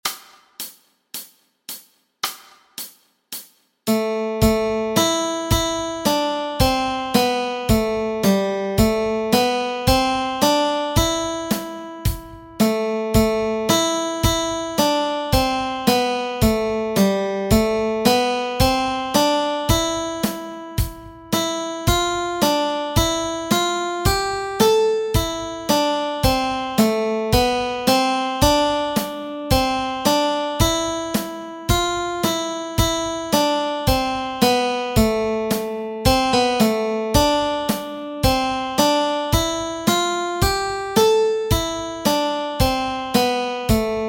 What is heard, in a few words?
melody